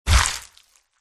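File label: undead boss attack contact bottom; umertvie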